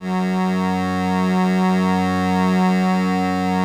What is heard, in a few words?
LAMBDA, String Machines